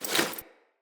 Foley Action Default Melee Swipe, Default Clothing; Player